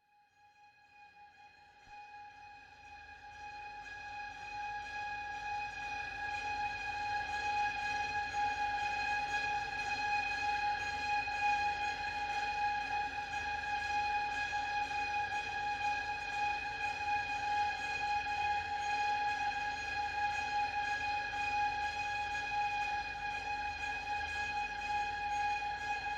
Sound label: Gloomy Conspiracy String Drone; Nordic Drama Drones